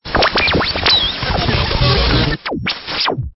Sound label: Stinger; STINGERS